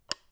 switch, UI Audio; Kenney's Sound Pack

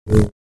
Saber; FXHome

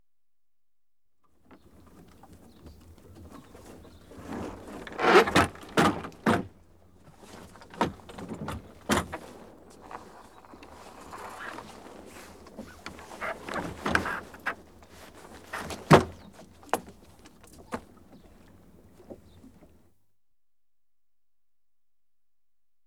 Sailing Dinghy, Sail Lowered; Ships And Boats; BBC Sound Effects Library; Ships And Boats 2

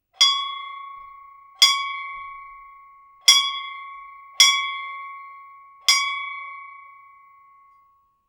worship Indian religious temple bell; Temple Bells; The AudioVille

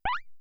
phase jump; Kenney's Sound Pack; Digital Audio

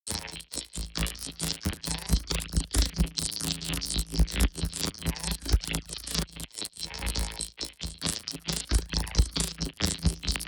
Electric Sparks Song Synth; Lesser Vibes

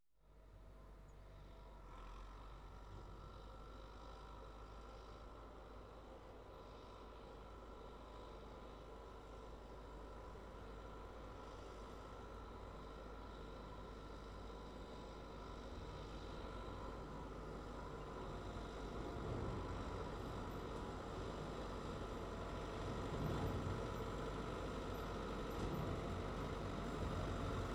Tractor Ford 7610 Tractor With; Farm Machinery; BBC Sound Effects Library

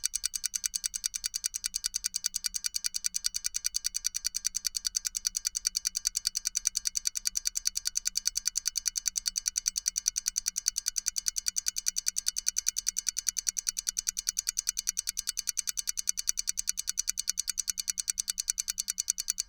CARTOON - timer chronometer close up, heavy; Game Audio Factory; Cartoon Materials

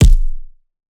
Punching Perc, Straight Glove; PROCESSED; 99Sounds; Punching Percussion

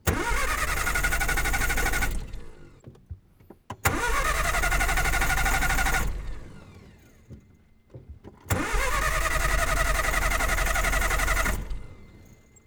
MG TF1500 False starts; On The Fly Audio; MG TF 1500 anno 1954

